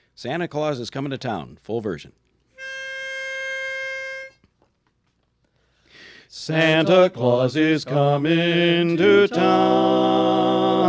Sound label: baritone